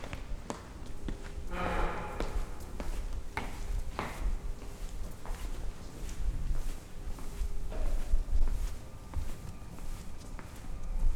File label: Rifling